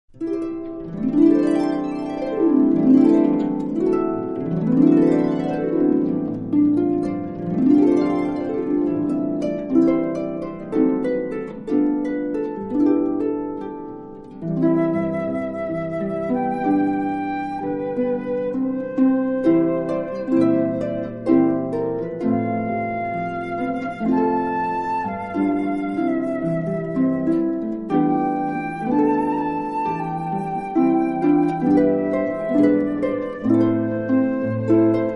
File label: harp